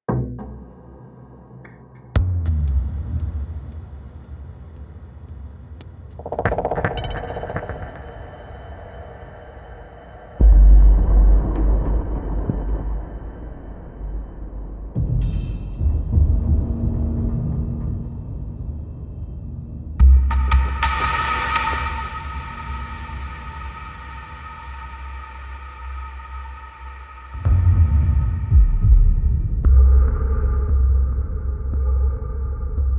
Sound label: rytmikbeats